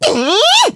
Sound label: Angry; angry ("Kyeeh!", "Eeee!"); The Lady